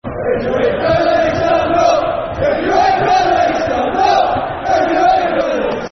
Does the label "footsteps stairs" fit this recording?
No